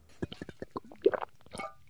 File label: drink potion